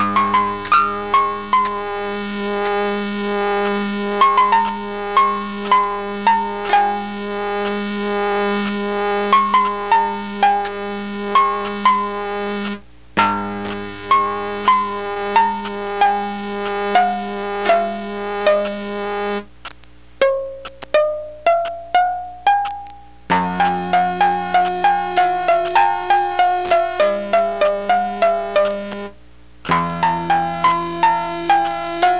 piano

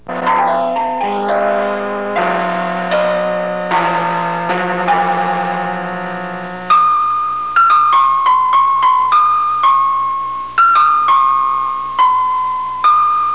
piano

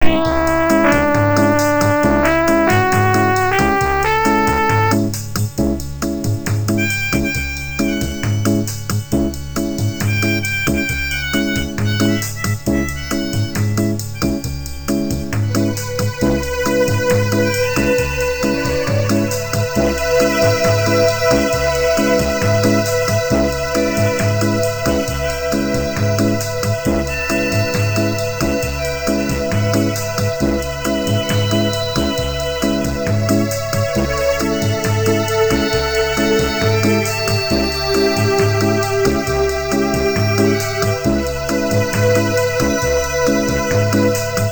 concerto

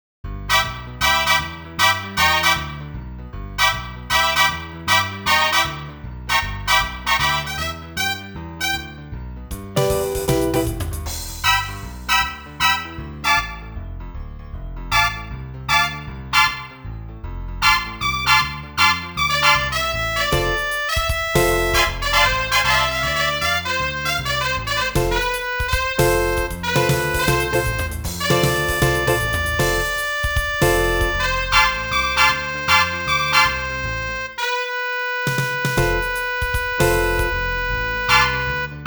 concerto

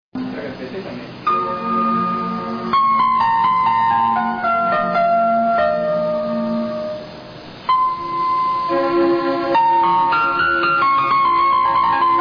piano

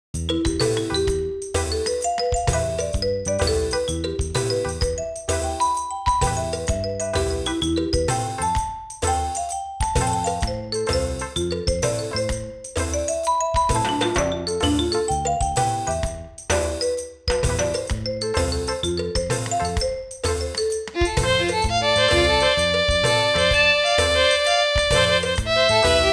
concerto

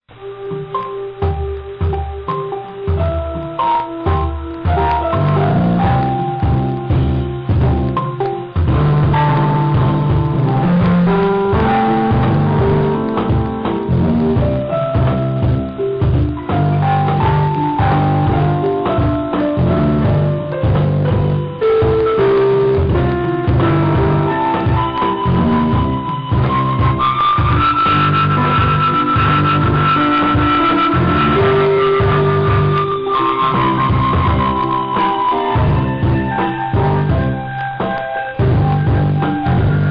future music

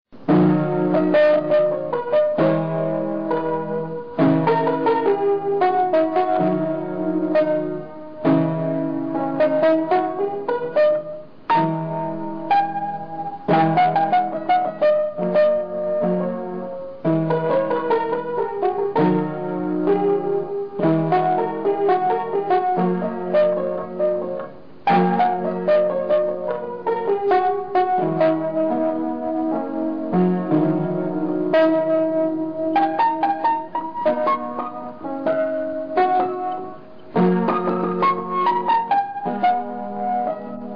piano

